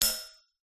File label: impacts metal cage small hit with metal object